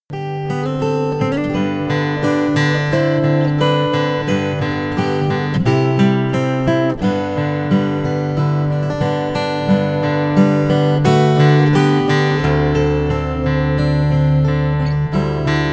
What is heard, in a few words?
hawai'i aloha instrumental